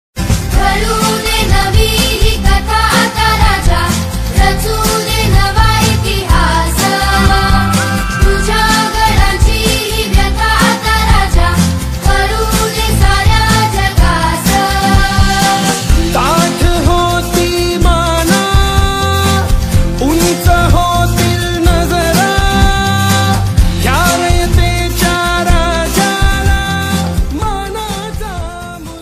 ringtone

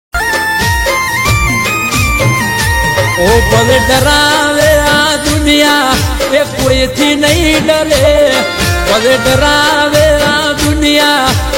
Ringtone

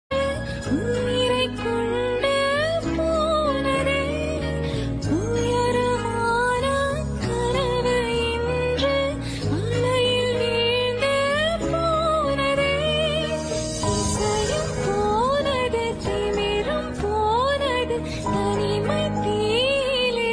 Song Ringtone

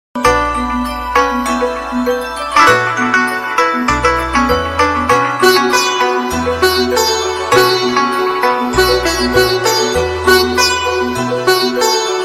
Happy diwali bgm

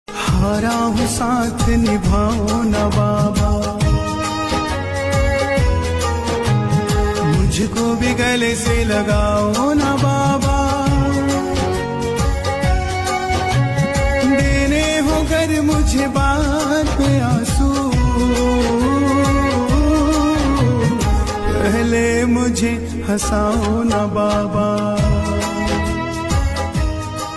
Ringtone; Khatu Shyam

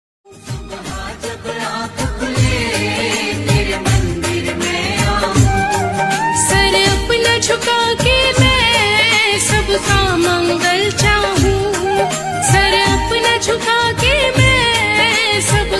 RingTone; Shree Khatu Shyam Ji

